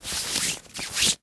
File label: Foley Nylon Pants Hand Brushing